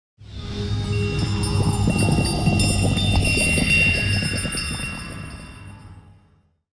magical stone

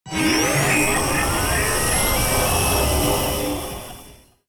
transformation

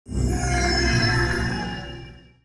open the demon's gate

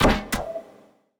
goblin hit

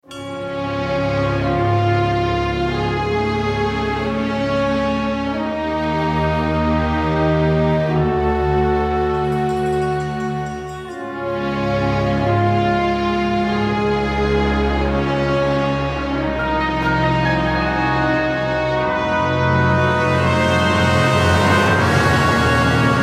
Open World BPM93 Db, Free Music

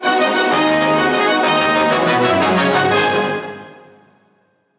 Fanfare BPM130 Ab

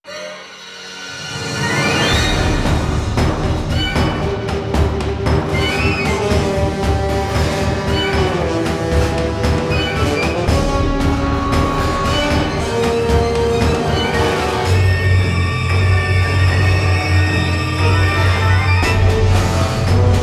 Monster Ebm

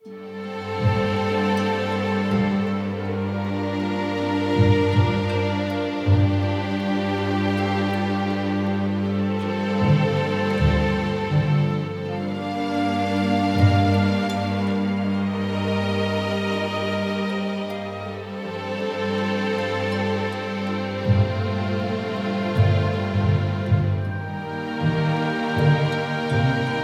Game Menu BPM80 Gm; 4/4; Strings + Woodwinds + Harp

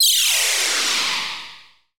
magical attack

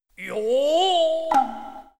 japanese traditional

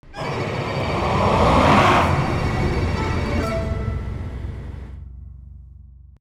Jingle Horror

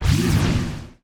sword swing

